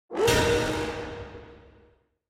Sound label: Flaming Jackal Chest; Arena